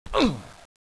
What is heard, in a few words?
fall pain